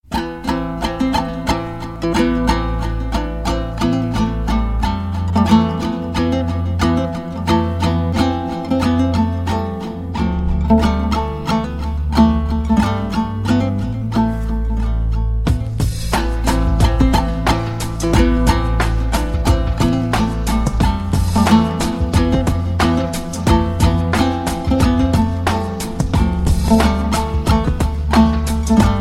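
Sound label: Turkish Turkey Ringtone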